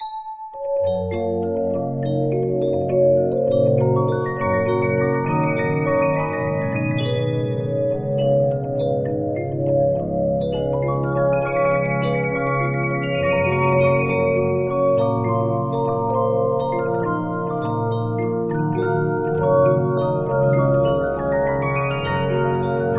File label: Regina, 15inch disc musicbox